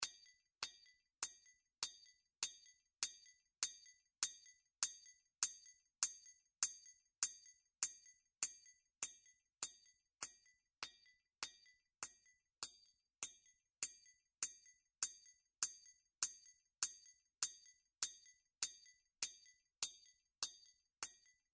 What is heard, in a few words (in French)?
Bris, binaural